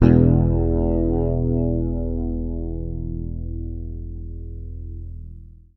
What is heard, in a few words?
E, Bass; Sampleheads; Will Lee Bass Library